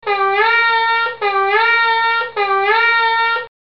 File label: shevarim